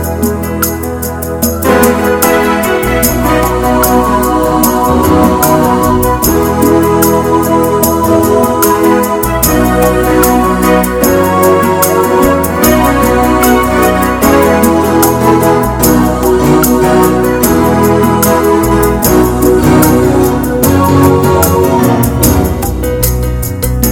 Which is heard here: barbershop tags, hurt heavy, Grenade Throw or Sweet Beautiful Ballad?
Sweet Beautiful Ballad